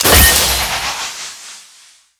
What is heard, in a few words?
Powerup Collected Maximized; Powerup Collected V2 Maximized